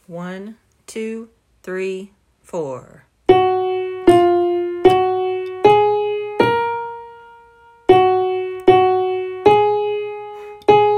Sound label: winds